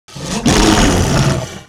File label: attack